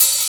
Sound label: OHAT; East West; Funky Ass Loops